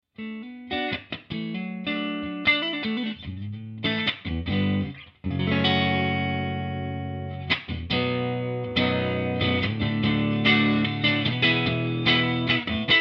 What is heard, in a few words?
clean